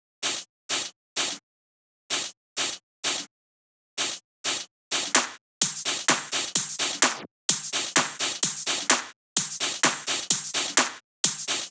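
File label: Drum Loop 128BPM Percussion; VEC5 Drumloops; VEC5 Multi Loops 128BPM; Loop Elements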